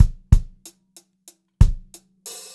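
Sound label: STRAIGHT VARIATION, Funk-Groove Drumloops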